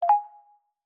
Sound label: People Confirm Yes; i OS; iOS10